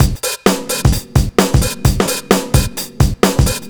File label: Live Breakbeat Full, Drum Loops 130bpm; Zero-G; Soundclash Nu Dance Electro; Acidized Wavs